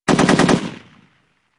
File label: ak47; Weapon